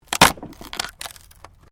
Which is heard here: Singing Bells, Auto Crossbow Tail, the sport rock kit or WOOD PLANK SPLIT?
WOOD PLANK SPLIT